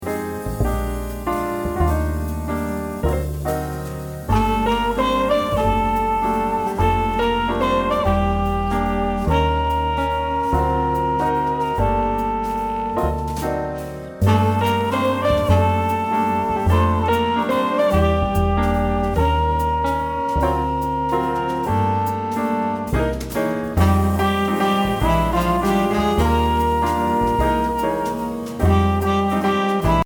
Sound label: Waltz